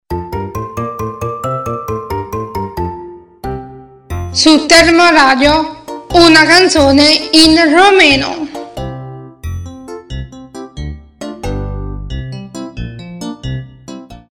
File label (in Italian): Canzone in romeno